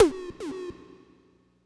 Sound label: Tom Slam Robot